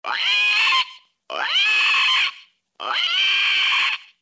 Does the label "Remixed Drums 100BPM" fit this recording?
No